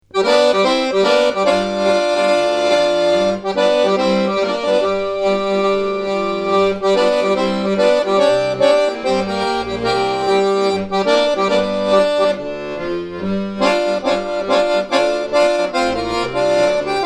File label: groove